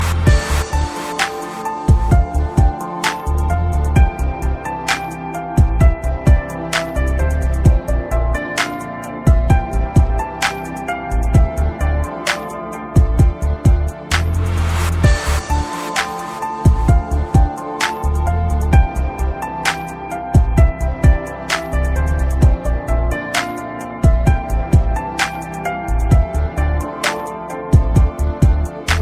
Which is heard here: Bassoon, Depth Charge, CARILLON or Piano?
Piano